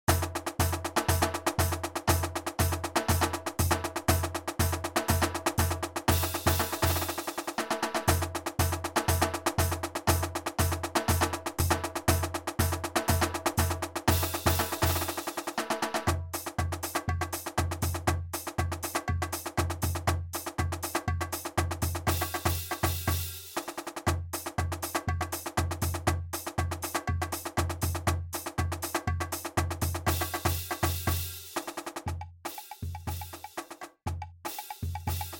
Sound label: groove